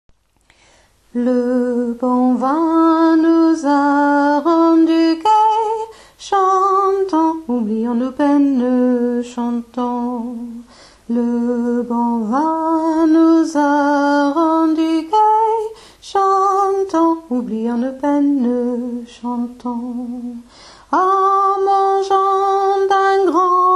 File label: alto; tourdion